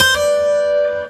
guit pluck; Sonic Foundry (Sony Creative Software); Ugly Remnants VOL-2

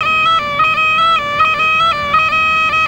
FLUT, India; Best Service; Ethnic Journey Sound Cube

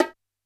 Bongo H, Standard Kit, Yamaha PSR-E323

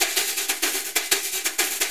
Percussion Loops, Shaker & Tamb; Houseworx

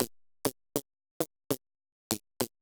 fart lick

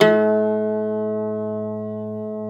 CIST A 2, cister; SoundBytes; Early Patches

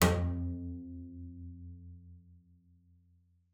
F2, bass pizz; Xsample VOL-5

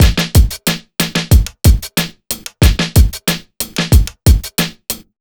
BEAT, Underground Hip Hop

